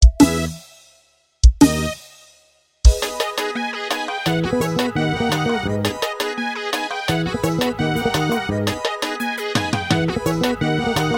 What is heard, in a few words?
bass